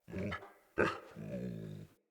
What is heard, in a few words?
Pack Wolf Idle Grunt; Animals